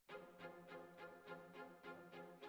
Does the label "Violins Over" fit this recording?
Yes